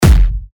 TECHKICKS G, techno kicks; BEERUS; Revealed Techno Kicks Vol. 10

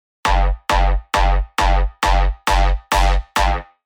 Offbeat, techno bass; spire; Apache; revealed spire techno bass vol 1

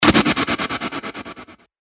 PERC D Lattice, percussion; revealed percussion vol 2

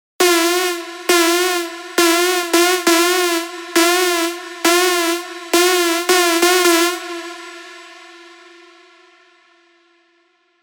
big room techno drops; Digital Fire; Revealed Serum 2 Big Room Techno Drops Vol. 1

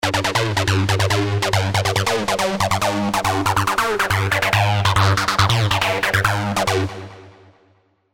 Acid, hard techno; Thunderking; revealed sylenth1 hard techno vol 1

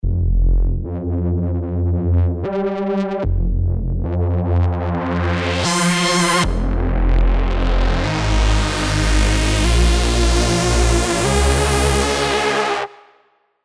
Reese Darkvoid, hard techno; revealed sylenth1 hard techno vol 1

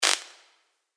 FX Hat, cymbals; revealed cymbals vol 1

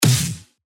Snare; GannicusPrime; revealed claps snares vol 2

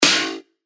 Snare; Kohle; revealed claps snares vol 2

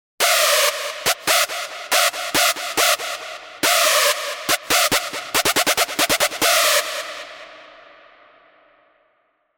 SY Screech, sylenth1 hard techno; Air Force; revealed sylenth1 hard techno vol 1

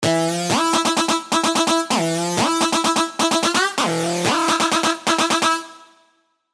LD, sylenth1 dirty dutch leads; Duckman; revealed sylenth1 dirty dutch leads vol 1

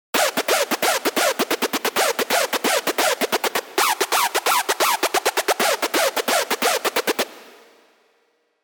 Screech Hallucisynth; revealed serum 2 rave asylum

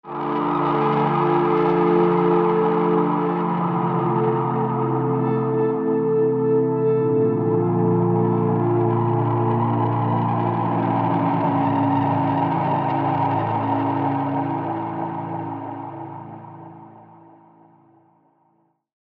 Close Whispers, underworld pads; diva; revealed diva underworld pads

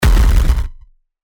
Hybrid, percussion; revealed percussion vol 2

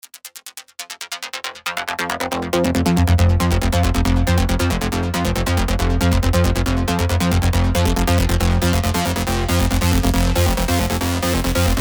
Bassline; Bassline Rollin; alonso 19 year anniversary pack; Spire

